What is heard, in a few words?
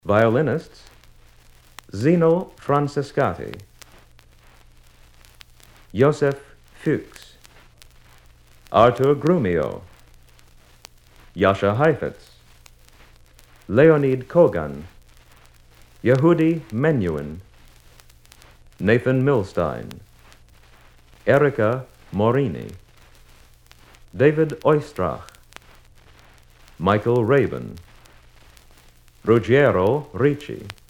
Violinists